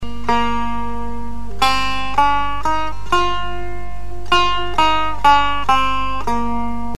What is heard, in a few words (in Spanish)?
Baiate Tork escala shekaste, Musica persa